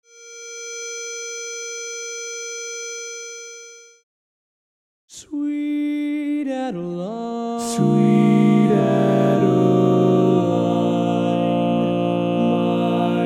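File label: baritone; you're the flower of my heart sweet adeline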